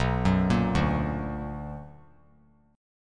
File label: B Minor Major 7th; arpeggiated; JGuitar